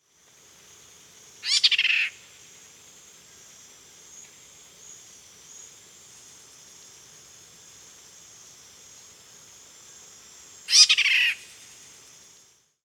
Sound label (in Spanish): Cuco ardilla Piaya cayana